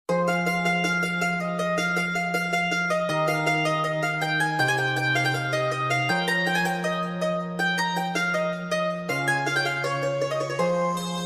instrumental ringtone english ringtone loving ringtone